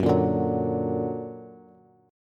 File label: Gb Augmented Bass; Gb+ strummed